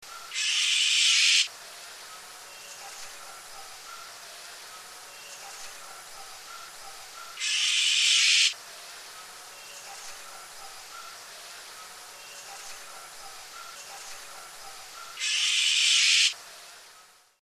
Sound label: Oriental Magpie Robin; Sri Lanka